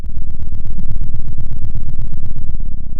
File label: pop, Sound of an engine revving